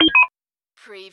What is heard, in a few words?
Servo Panel Sound - Keypad